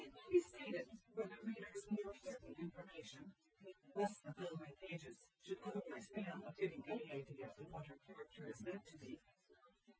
female

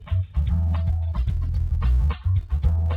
Latino DUET

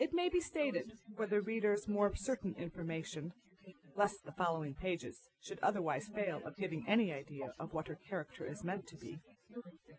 female

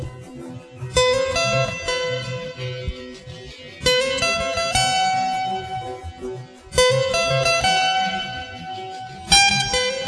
spanish

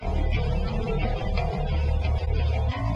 Groove

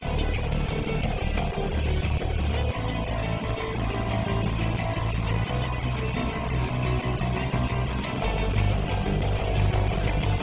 groove hard

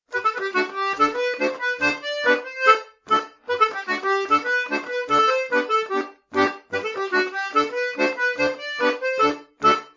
accordeon test

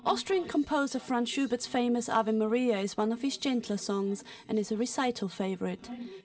guit sep

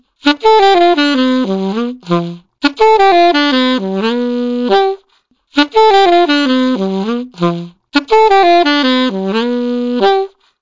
sax test

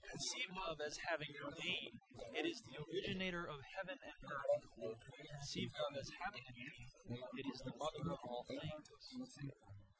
female

